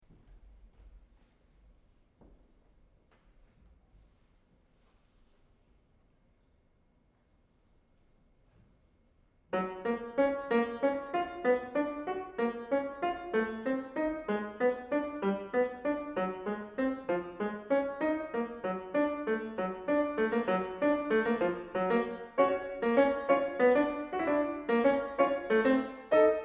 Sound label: Piano